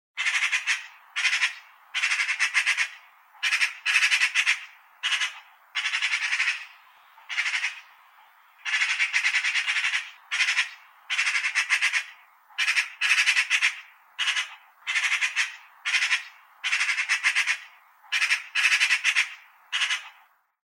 ptica soroka

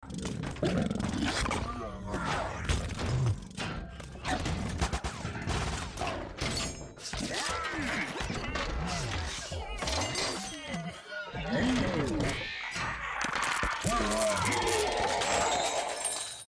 zvuk transformera

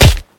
udar kulakom v lico

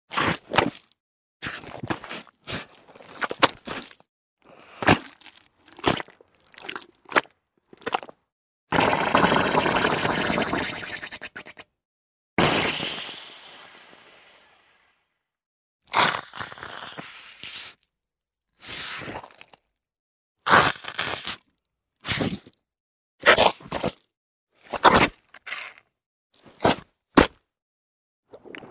gryaz mesivo

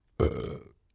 korotkaya otrizhka zaregistrirovana na re 20 v obrabotannom pomeshenii

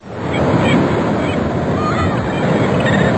okean shumnii myagkii ptica

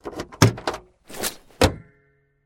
zvuk zakrivaniya dverej s shekoldoj

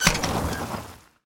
korotkij skripuchij zvuk

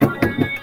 gromkij stuk

